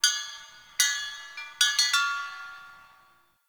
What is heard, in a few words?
Chimes, Ambience; Midrath Island; Fey Hub